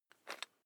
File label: electric drill bit fasten wrench turn fast